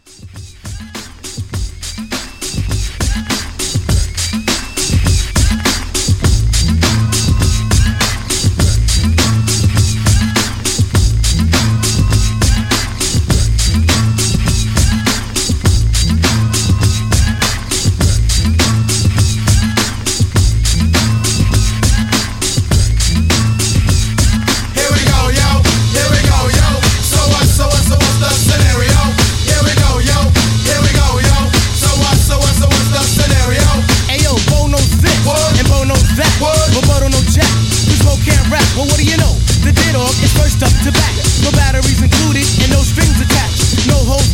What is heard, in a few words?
90s Hiphop Re Drum, 102